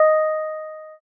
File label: piano